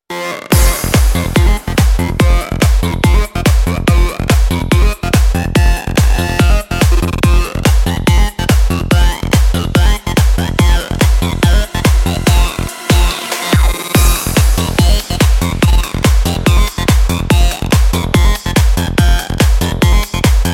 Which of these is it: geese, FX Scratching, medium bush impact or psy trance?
psy trance